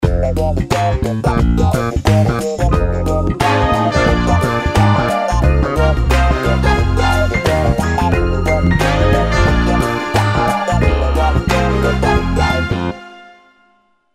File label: Slapped Up, ringtones; TCL S300T